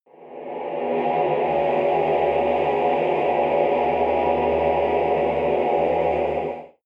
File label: evil choir chord dissonant